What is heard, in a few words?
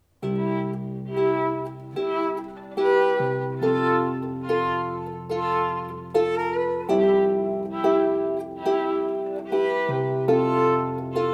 harp trio